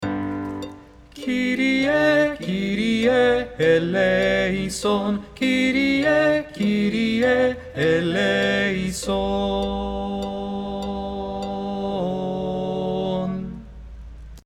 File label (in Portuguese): soprano oitavado; Kyrie